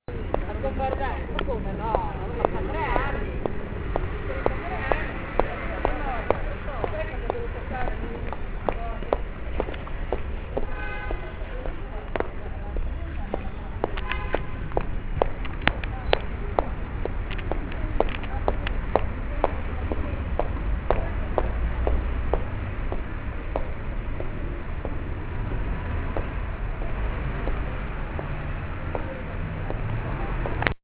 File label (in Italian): passi; riminisoundscape